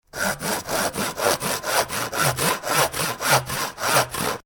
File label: Saw Wood With Hand Saw; Saws; Machines; Saw Sound Effects